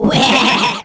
Bubble Appear

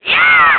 Spin Attack; Link's spin-attack shouts; Voice #2: The Wind Waker

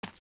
Dog Walk; Zelda; OOT

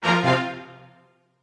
Battle Strike

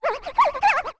Deku Princess Complain

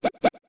Sob; Baby Goron sobbing

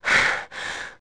Breathe; Gerudo; zelda; OOT

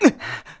Hurt; Link getting hurt

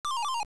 Item Drop, Enemy dropping an item; AOL

